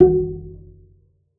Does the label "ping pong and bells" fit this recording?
No